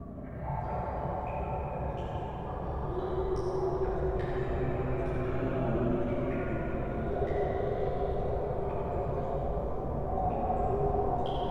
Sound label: Mines Ambience; Shrek 2